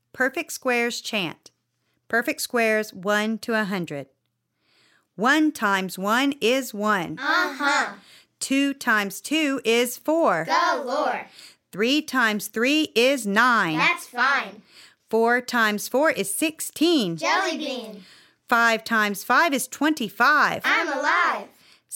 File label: Perfect Squares Chant, Math Jingles